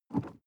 sports car int pedal gas release; verkehr transport; autos

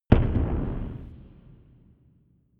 gun pop gun pop

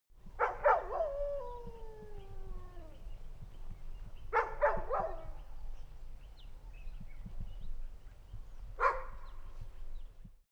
bark ambience fall, hunde; natur-tiere

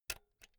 pull out jack cable slow; objekte; elektronik

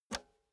pull out xlr multi socket hard; objekte; elektronik

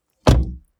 car bmw e46 exterior door front close; Cars

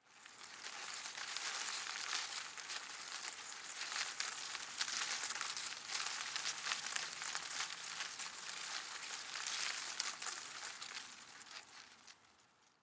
shrub small leaves rustle medium; haus-garten

